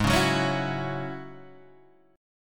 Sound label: Ab 7th Suspended 4th Sharp 5th down Guitar DADGAD